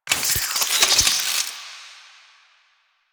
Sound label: Equip, Sidearm; Weapons; Warframe; Tenno; Wraith Sidearm; Epitaph Prime